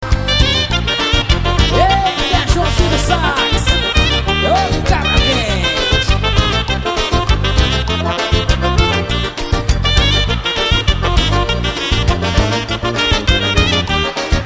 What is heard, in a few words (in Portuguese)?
sax estrumental forro